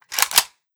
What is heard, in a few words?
THOMPSON reload; Jagged Alliance 3